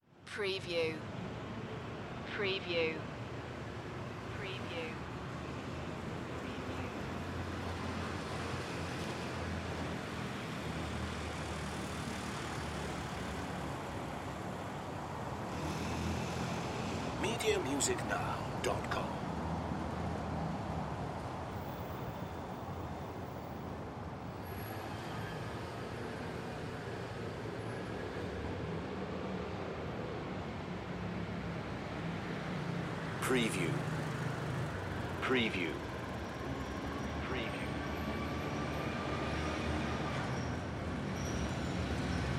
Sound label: Italy Street Traffic; Sound Effects Bible